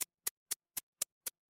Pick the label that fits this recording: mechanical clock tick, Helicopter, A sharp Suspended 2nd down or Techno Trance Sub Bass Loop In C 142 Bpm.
mechanical clock tick